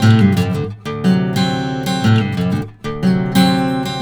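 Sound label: baritone riff, Blues Guitar; ILIO; Fretworks; 120 BARI RIFF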